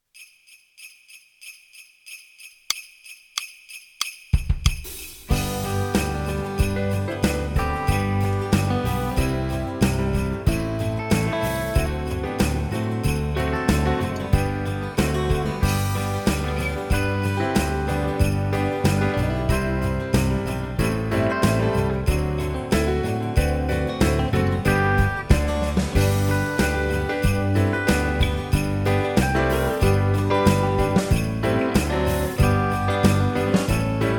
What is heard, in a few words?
jingle bells versio rock